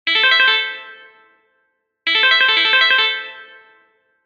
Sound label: sweep 3 c, guitar